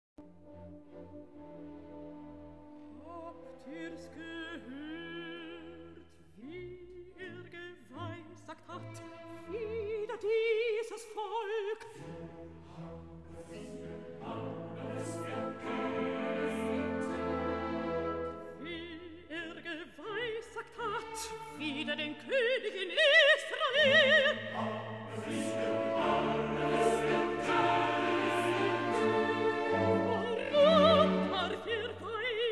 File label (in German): Rezitativ mit Chor. Der Herr hat dich erhoben; Mendelssohn Bartholdy (Felix); Elias